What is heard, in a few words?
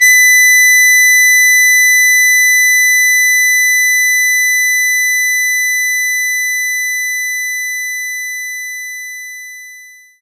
Harmonica B5